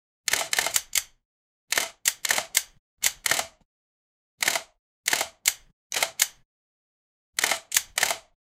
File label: reload sound gun